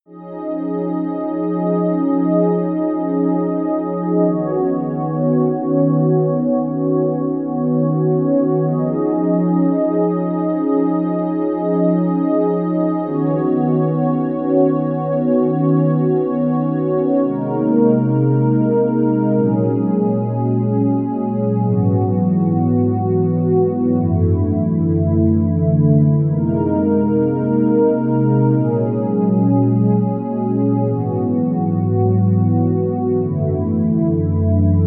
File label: Dark Pad; VIVO S10